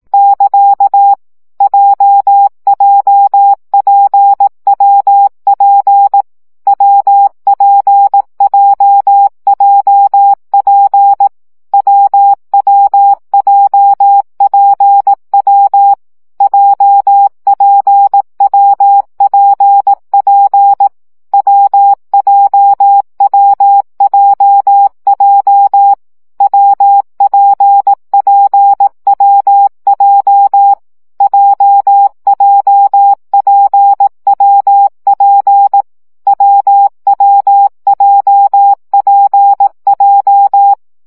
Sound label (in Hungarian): CW gyakorlas w p j 15 baud